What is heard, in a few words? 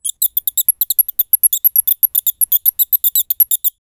rodent